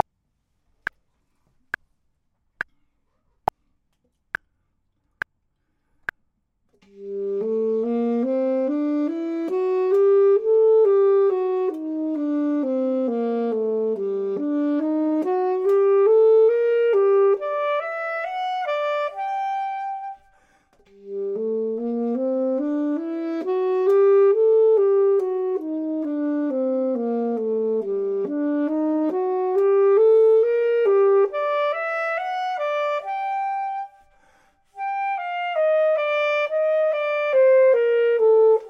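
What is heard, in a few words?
top, Saxophone and Clarinet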